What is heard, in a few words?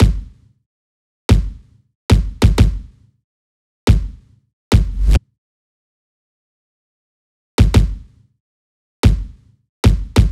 kick loop 93; Pop; Drums; Loops; Slate; SD PASADENA Pop Sample Pack